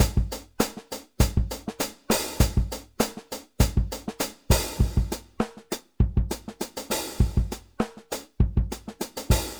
Wave Drum SEP MIX

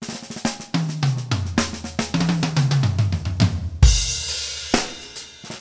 Drum Solo

